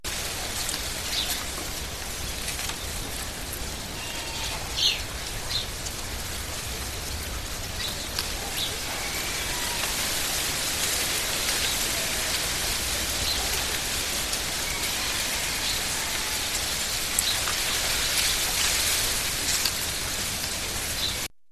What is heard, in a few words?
country birds